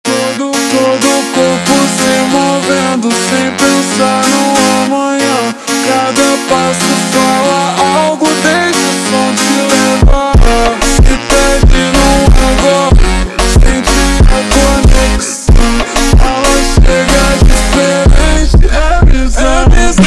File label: Funk ultra Slowed